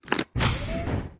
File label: Door Platform; Aporkalypse Pigs of Doom